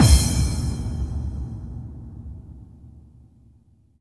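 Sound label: bass drum FX, dance drums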